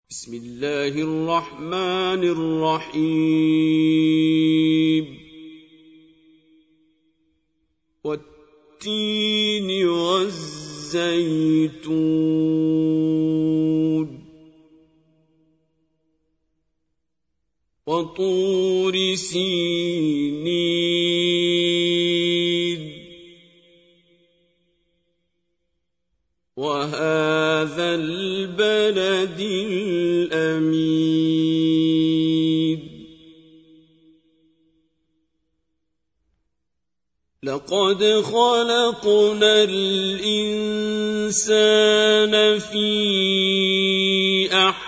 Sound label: Recite, qari quran; Surah Al Tin; Qari Abdul Basit